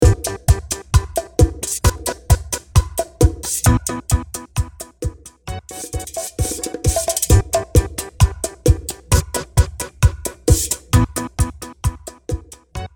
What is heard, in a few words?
Intro Outro 132BPM